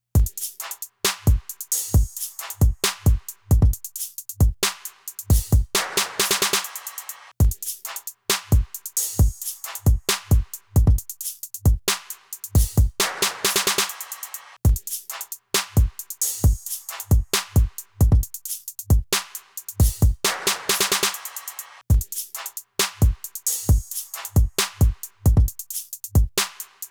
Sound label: instrumental